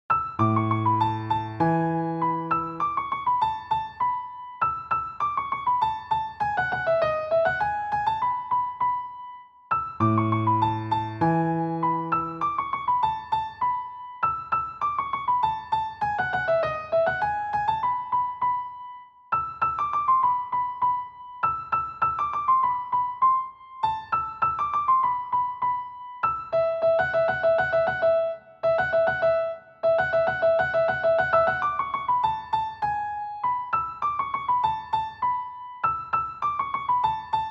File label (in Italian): Bagatella in la maggiore